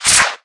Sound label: slide; Snakefruits cookie